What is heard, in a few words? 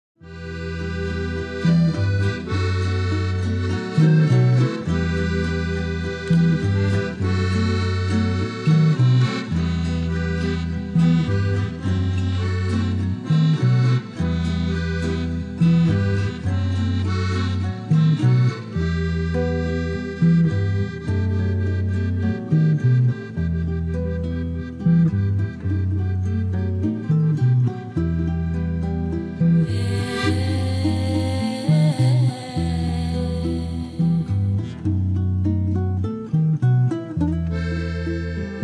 fuga